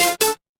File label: retro game game over